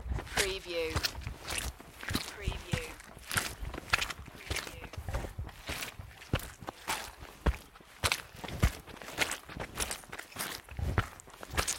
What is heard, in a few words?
Hiking footsteps and breathing; beanstalk audio